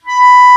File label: Clar mf B4; Digital Sound Factory Virtuoso